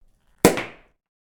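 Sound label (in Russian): звук пробки от шампанского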